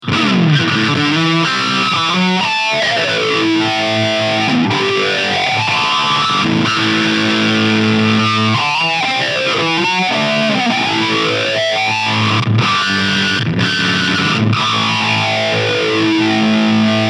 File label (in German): Death By Audio Disturbance Faser